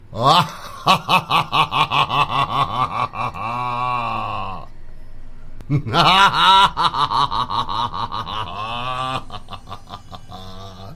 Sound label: chuckle